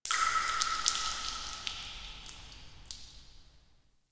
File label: Interior Cave Drips, Ambience; Warframe; Duviri